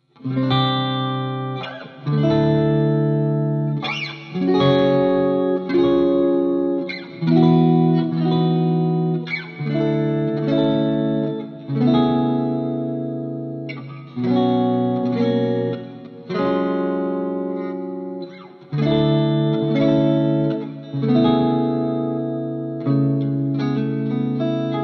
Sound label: clean